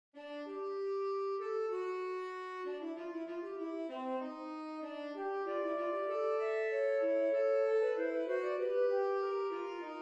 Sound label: Fugue G Minor Saxophone; Rimsky Korsakov